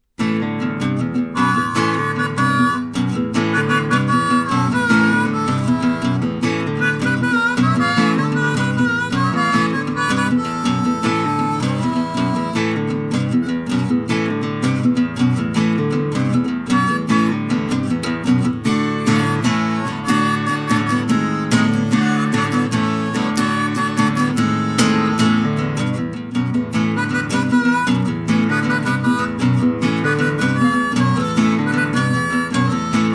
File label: untitled instrumental